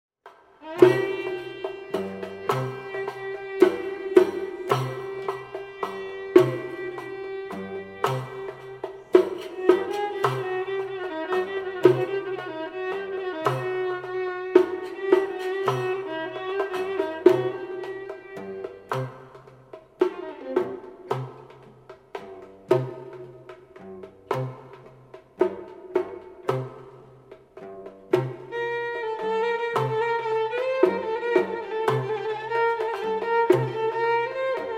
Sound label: Taqsim Nahawand, Violin